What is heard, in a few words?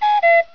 cartoon